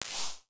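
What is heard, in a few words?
impulse response, waveguide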